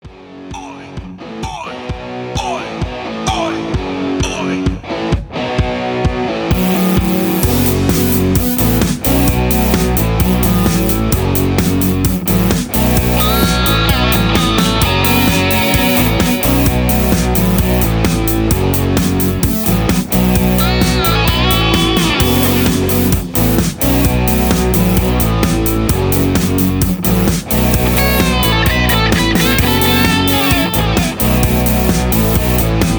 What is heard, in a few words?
Harder Rock Mix with BGVs